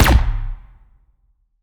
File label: explo gas can swt; weapons; Half Life Alyx